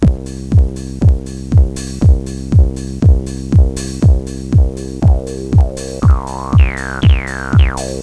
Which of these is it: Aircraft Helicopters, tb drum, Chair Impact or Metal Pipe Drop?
tb drum